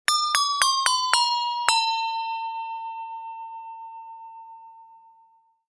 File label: lose